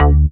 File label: Bass Shot D